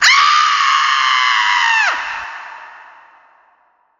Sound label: Scream; I.Bergman